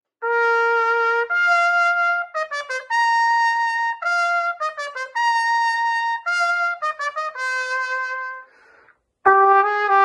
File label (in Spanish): trompeta